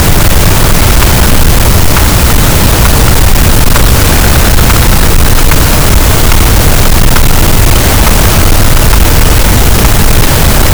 cachalot, Physeter Macrocephalus, gaussian noise

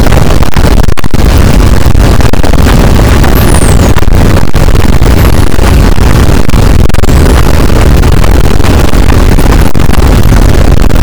cachalot negatif, boat, Physeter Macrocephalus

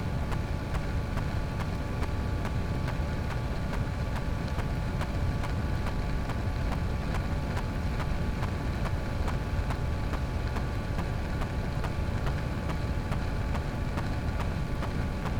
cachalot negatif, Physeter Macrocephalus

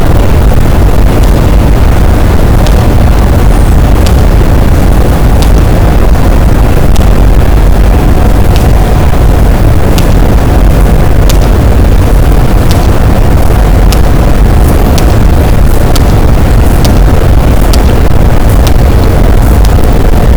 cachalot positif, boat, Physeter Macrocephalus

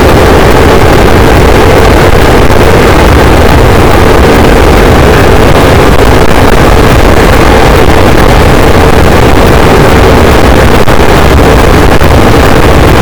cachalot negatif, boat, Physeter Macrocephalus

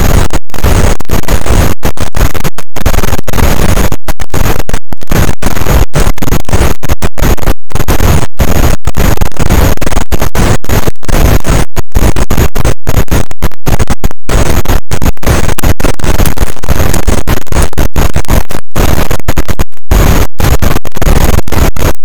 cachalot positif, boat; Physeter Macrocephalus

